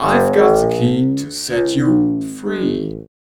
VOICE FX, Dance Vocals

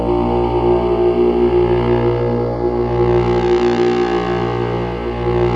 SYN Wavomatic -R; Best Service Gigapack 1

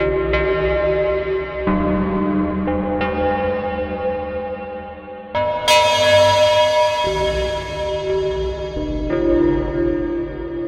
Melody, IDM; Sonic Foundry (Sony Creative Software); Twine-IDM

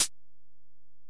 HiHat, Alesis DM-PRO

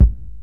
Korg x5, Drums

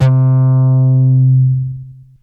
Bass Synths hits and octaves

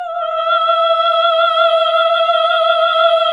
Nuns Aahhh, AAH; Sound & Vision - Gigapack II CD 1 (Roland); HAL NUNS AAH OOH

